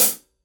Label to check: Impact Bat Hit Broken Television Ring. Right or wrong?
Wrong